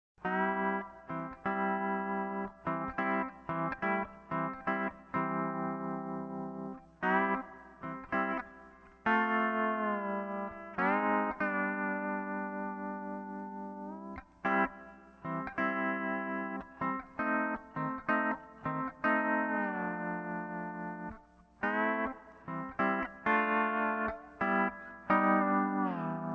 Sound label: steel